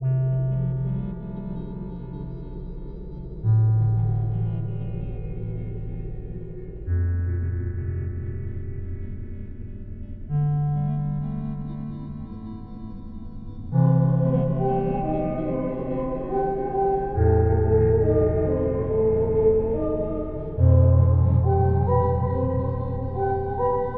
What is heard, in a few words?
Trance